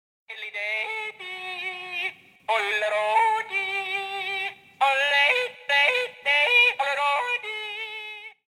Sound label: Yodel